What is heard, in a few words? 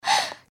gasp; pony; Twilight Sparkle